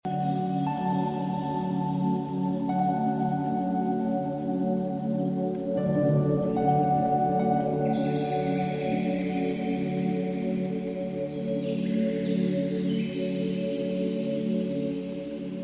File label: 432Hz Celtic Soundscape